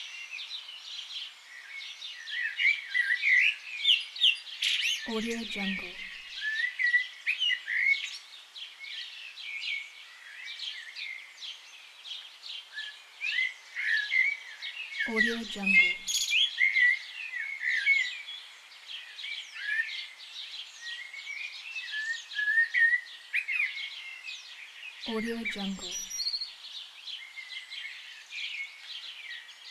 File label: birds; آواز پرندگان; nature sounds